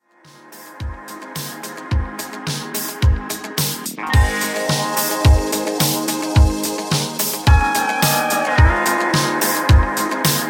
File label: Country Redrum 108 Bpm